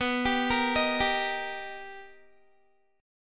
B Augmented Major 7th B Guitar, chord; B+M7; arpeggiated; 19,22,20,20,19,x

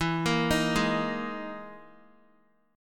E Major 7th Flat 5th, Guitar; arpeggiated

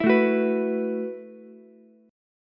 F sharp Minor 11th, Ukulele, C6; strummed; JGuitar

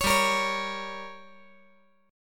G Diminished

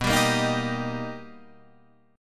B Augmented Major 7th B, Guitar, Drop D

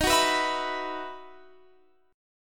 E Major 7th Suspended 2nd, Eb

